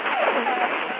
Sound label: hum